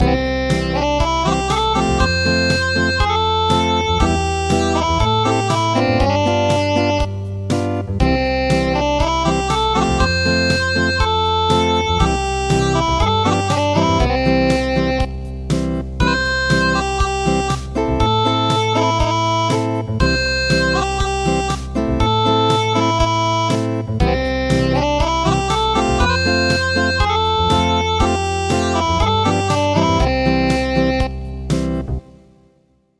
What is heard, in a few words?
Acoustic